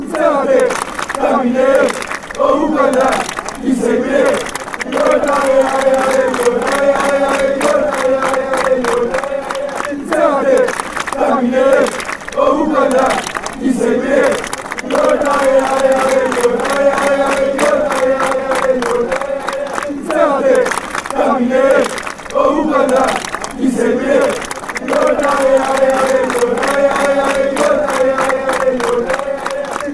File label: ill walk with you fan chants